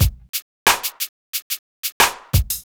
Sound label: Dry break